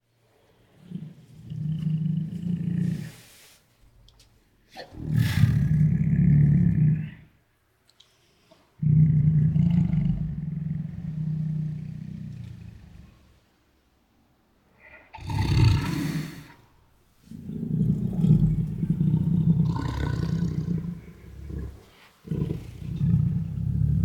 Scary Ferocious Growls, Tigers; Sound Ideas

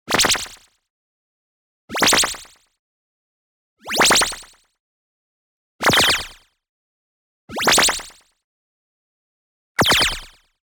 ZAP Deny Short; boom library; Anime Essentials; DSGNMisc

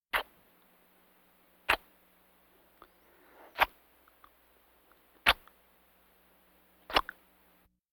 Vocal effects breathing Moaning Baby; Valentino Production Sound Effects Library CD50

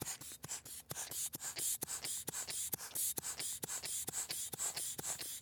Sphygmomanometer, Medical; Blastwave

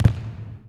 Bodyfall Basketball Court

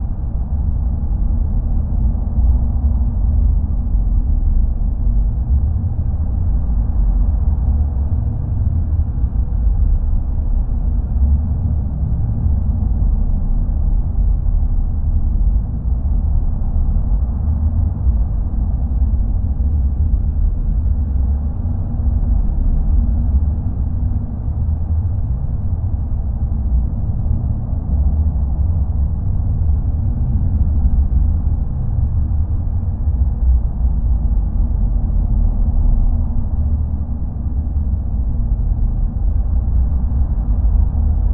Sea Treasure Room

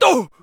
male pain; firefight

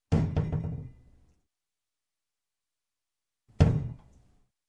Door, Cupboard Bounce Close; Sound Ideas 2000 Series

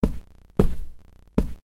A Few Steps; blindside

